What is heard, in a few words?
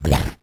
slime hit; Crypt of the NecroDancer